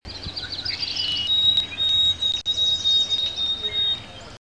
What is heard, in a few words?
white throated sparrow; Birds; Sparrows